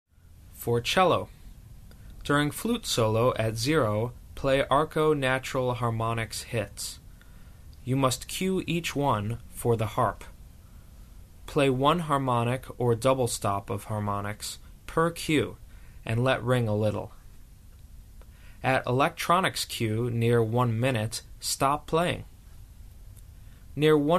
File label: Score Cello; EricRoth